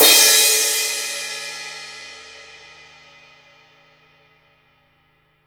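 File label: CYMBAL, real drum kit; HIPHOP KIT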